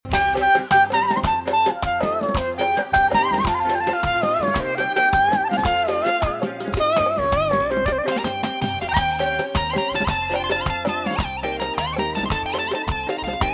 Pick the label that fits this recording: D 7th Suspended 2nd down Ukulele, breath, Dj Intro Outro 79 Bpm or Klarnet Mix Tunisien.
Klarnet Mix Tunisien